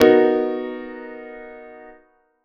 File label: Cb (B) major 7th harmonic intervals